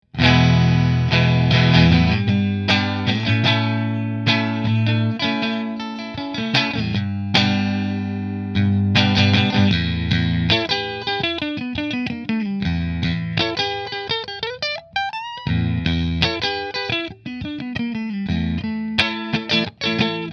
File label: Fender Strat Kinman Position 1 Fender, guitars